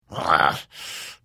Hit, Vo; The Elder Scrolls III - Morrowind; Morrowind GOTY GOG

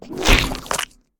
deep ooze slam; SyrinScape; East Ward

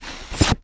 axe away; The Elder Scrolls III - Morrowind; Morrowind GOTY GOG

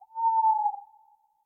Tawny Owl; SyrinScape

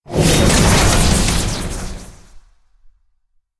Shield; SyrinScape; Library Depths

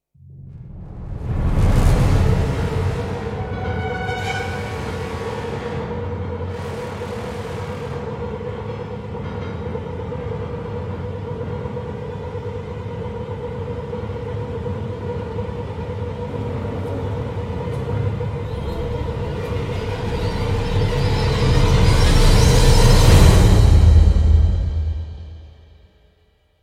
no choir

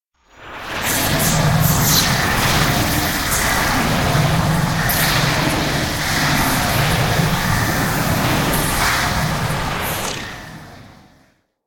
Detect Poison, Clockwork entities; SyrinScape

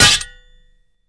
spider HIT; The Elder Scrolls III - Morrowind

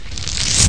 shield, magic; The Elder Scrolls III - Morrowind; Morrowind GOTY GOG

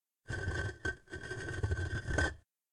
Deep Rumble Walls

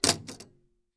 button drop; Papers Please

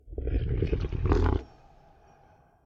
Shadow giant grows and grumbles; SyrinScape SoundsScape Collection; bbh Haunting part 1; TTRPG

